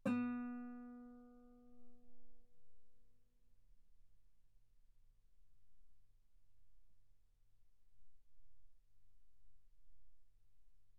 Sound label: Guitar B3